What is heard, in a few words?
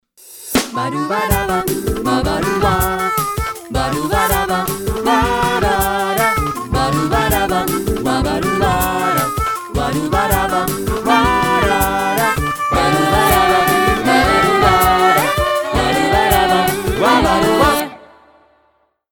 Logo